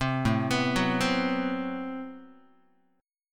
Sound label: A Minor 6th Add 9th Guitar, chord; Am6add9 arpeggiated; 3,5,2,4,4,x; JGuitar